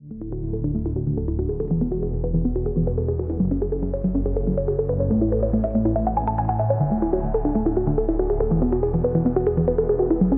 dubstep marimba ringtone; android

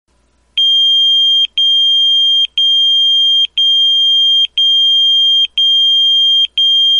old school beeper ringtone; android

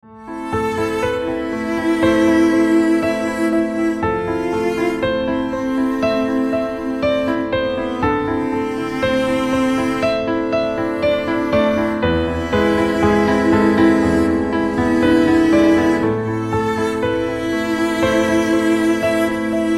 piano triste ringtone

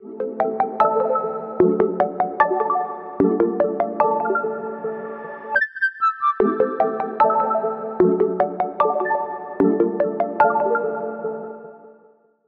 google ringtone

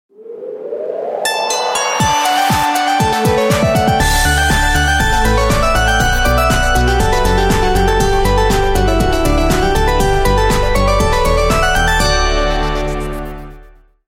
sony ericsson canon ringtone

